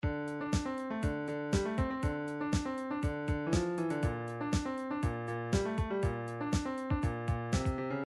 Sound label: midi drums drums funk